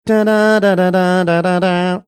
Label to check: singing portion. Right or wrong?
Right